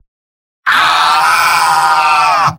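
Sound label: spy pain critical death; vo; tf2; mvm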